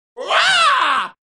death sound; lego jar jar binks

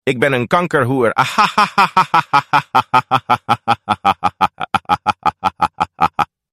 hahaha

